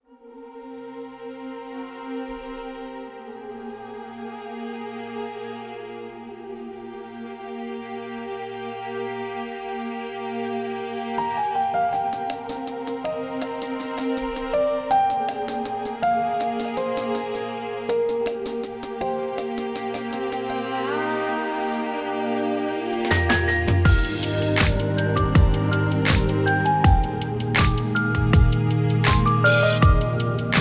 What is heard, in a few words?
chill laid back beat